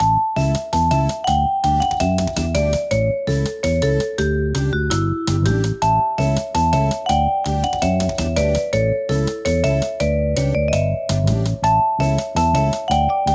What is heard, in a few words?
Menu Pause